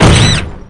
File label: slam